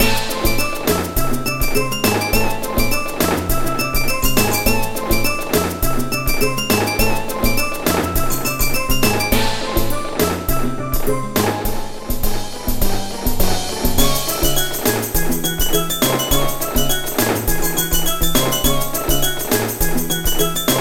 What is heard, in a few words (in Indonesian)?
Nada Dering Nokia Jadul; Caribbean